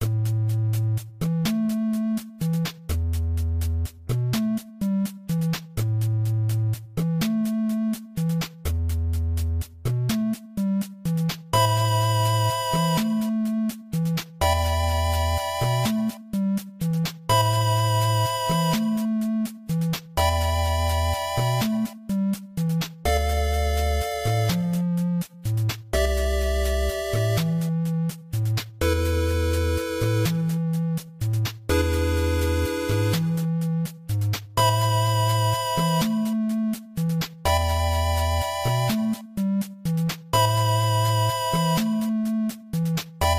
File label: Music; 1bit godot course